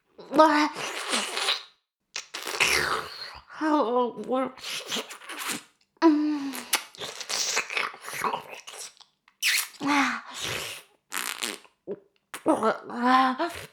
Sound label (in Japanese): フェラ音声; 牧場戦腺; 少尉